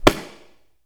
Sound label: Boxing Slap Stick